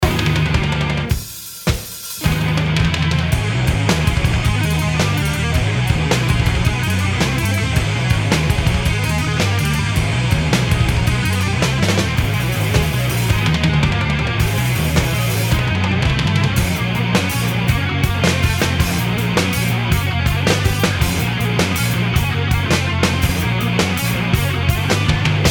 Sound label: solo